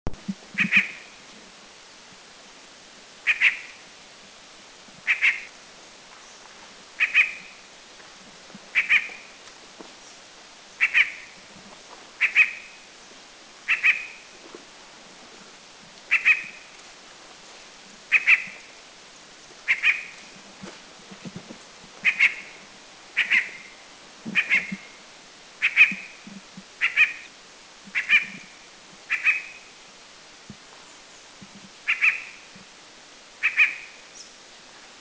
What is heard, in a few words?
Giant Nuthatch, Thailand; Doi Ang Khan